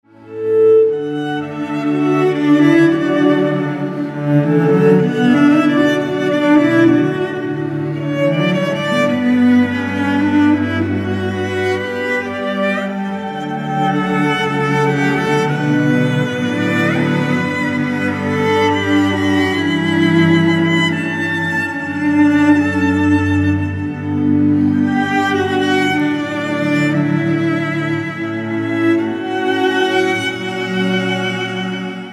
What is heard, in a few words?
Oboe for Two Cellos